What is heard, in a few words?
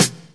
sizzle steel snare drum sound e key, Royality free acoustic snare tuned to the E note; snare drum samples